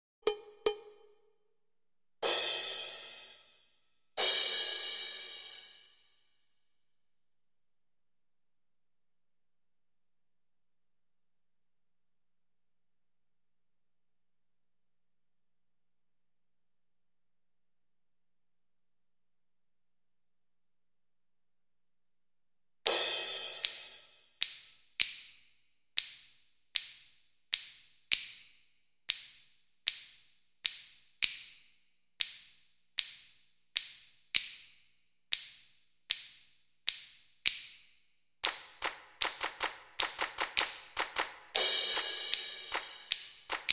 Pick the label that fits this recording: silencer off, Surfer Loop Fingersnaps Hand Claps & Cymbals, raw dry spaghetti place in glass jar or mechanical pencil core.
Surfer Loop Fingersnaps Hand Claps & Cymbals